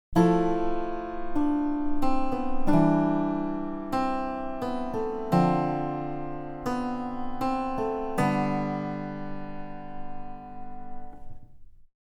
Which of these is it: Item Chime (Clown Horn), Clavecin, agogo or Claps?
Clavecin